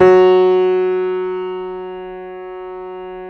JAZZ F#2, Keyboards Acoustic; USB Soundscan vol.17

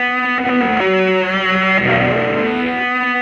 Sing Stay, Electric Guitar; Sonic Foundry (Sony Creative Software); Friendly Fire; Serpent

